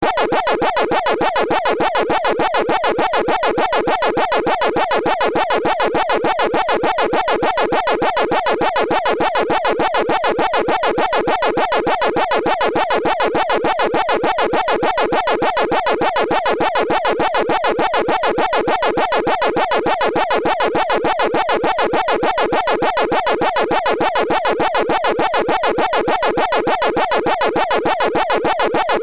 Pac Man Eating

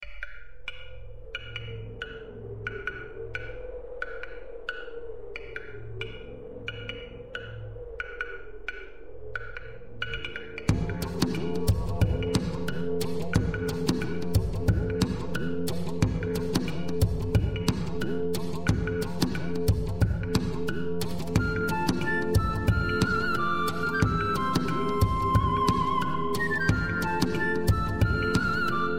fantasy flute; Electronica

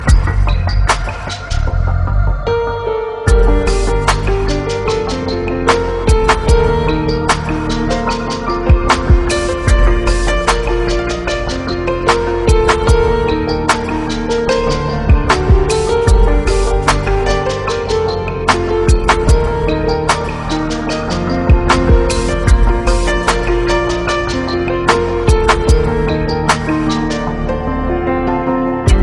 piano; Indian

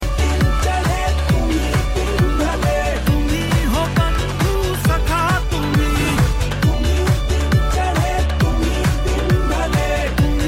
cocktail music; Indian

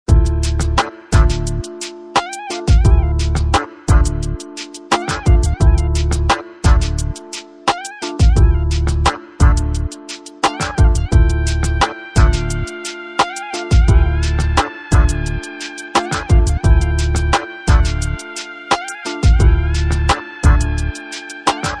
Beautiful Jazz Ringtone

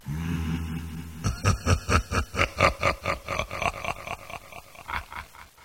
laughing gorgon

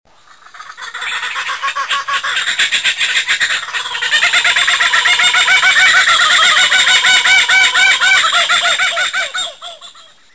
kookaburra voice; Animals

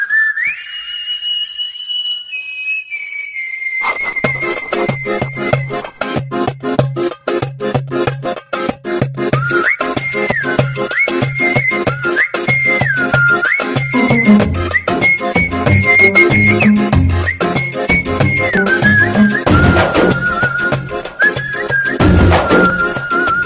flute; Raja Rani

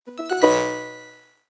OPL3 notification tone

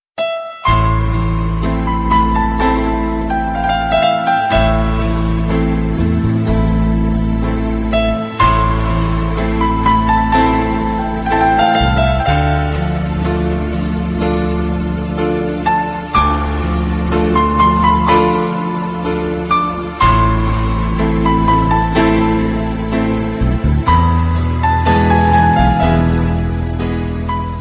pianino

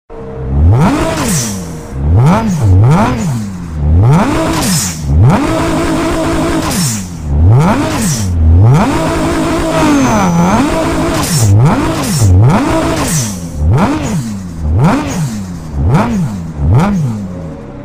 Nissan Skyline Gtr

